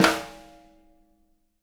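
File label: jazz snares; Sampleheads; Peter Erskine Living Drums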